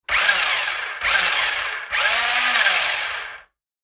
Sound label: RC toy car remote controlled short rev; rc toys sound effects